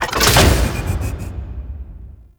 Space Port Large Door Close; Venus Open World; Objective Rooms

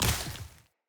Short Sword Impact Vegetation; Weapon Impacts; Enemy; Swords; Sinecure Short Sword

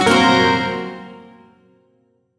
Shawzin Blues Chord; Warframe; Duviri

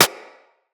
Bullet Impact Generic

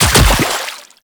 Land Water, Footsteps; Warframe; The New War; Characters; Kahl

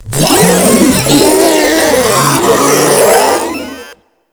Proto Infested Ancient Tentacle Swing Scream; Warframe; Abilities

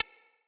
UI Common Click

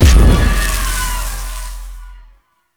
Passive Fully Charged; Valkyr Rework; Warframe; Berserker

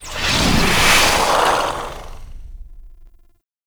Beam Start; Warframe; Entrati; Conquest; Vampyric Liminus

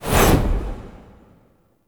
Perfect Heavy Attack Proc, Weapons Melee

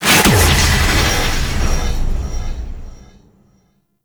Corruption Cast; Enemies; Abilities; Warframe; Zariman; Void Angel

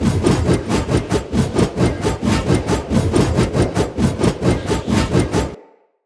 Great Sword Throw Loop; Weapons; Swords; Dendrit Ara Great Sword

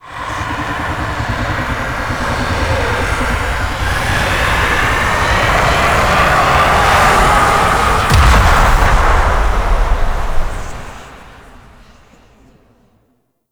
Extraction Depart Long, Vehicles Flight; Warframe; Liset; Stalker Liset Skin

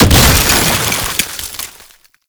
Snow Globe Burst; Warframe; Nineteeen Ninety Nine; Deep Archimedia

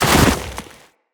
Land Soft Deep Snow, Footsteps; Characters; Operator

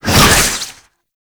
Whip Whoosh; Warframe; Oraxia Whip; Weapons Melee

